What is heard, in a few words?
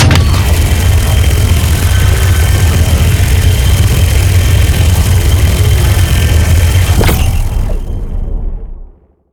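Sci weapon Shot Beam Large Cryonic Bombard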